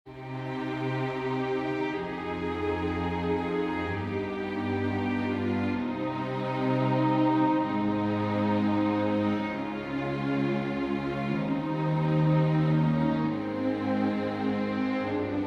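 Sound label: prélude, string quartet; chopin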